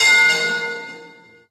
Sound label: metal falling